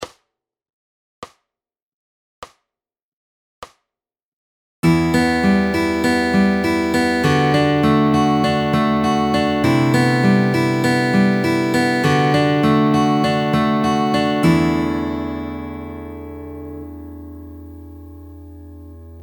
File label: Fingerpicking Pattern All Chords; Fingerpicking Fun